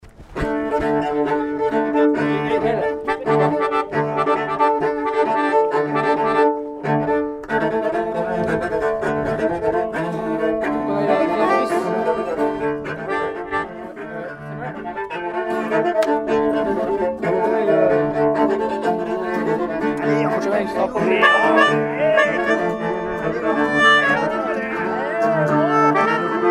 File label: Sefarade freestyle Cello; St Pierre